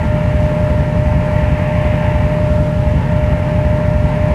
health low loop

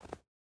footstep rubber boots snow jump start; Rust

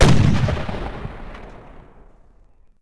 Cannon Fire; Civ4 warlords

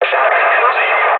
ambient battle; World Of Warships

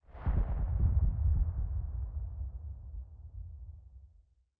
combat atmosphere; World of Tanks

